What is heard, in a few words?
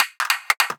Beatbox Fills 150, Hybrid Beatbox Drums; Antidote Advent